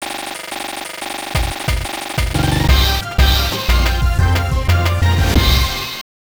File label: Sonic Jingle 1 (Stage Clear 1); Aero Fighters 3; Neo Geo; arcade